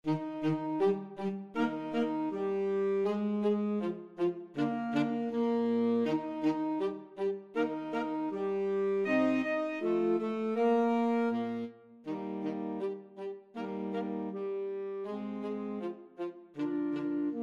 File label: flexible mixed ensemble, 4 players